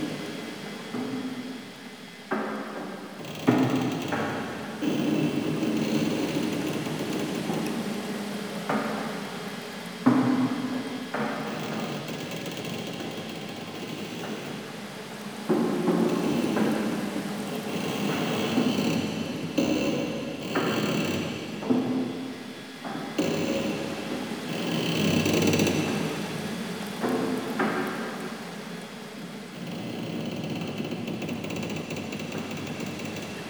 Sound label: Amb Loop, Interior; Warframe; The New War; Zariman